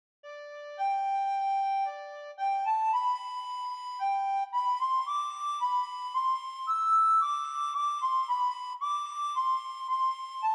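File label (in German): Sopranblockflöte solo; Sankt Martin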